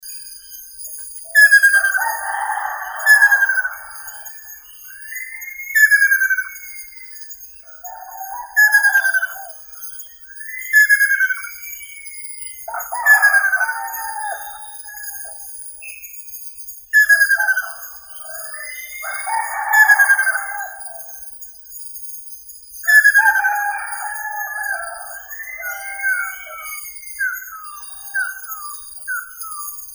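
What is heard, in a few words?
ruddy kingfisher, Halcyon coromanda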